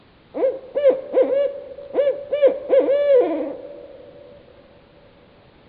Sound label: Barred Owl